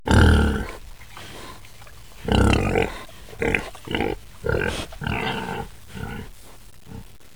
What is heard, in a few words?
pig; vision